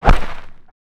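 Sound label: explosion acid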